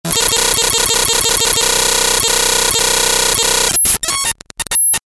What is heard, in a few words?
code digital hard